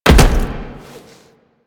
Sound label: Land Heavy Glass, Footsteps; Warframe; Tenno